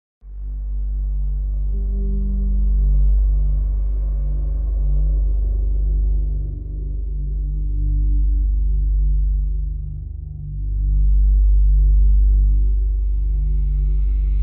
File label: Ambient Waves